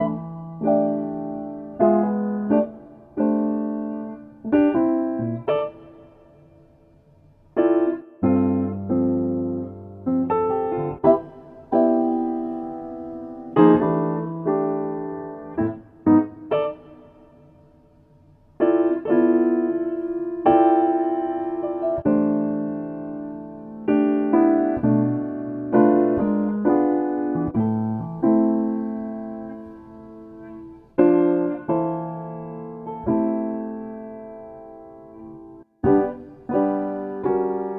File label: Fortepiannaya Muzyka Retro